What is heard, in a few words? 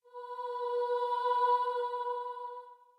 starting note, voice; dynamics challenge